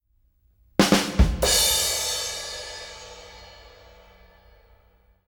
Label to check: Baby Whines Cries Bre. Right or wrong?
Wrong